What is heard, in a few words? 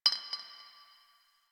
Garbage Glass Drop; Warframe; Ambience; Nineteen Ninety Nine; DE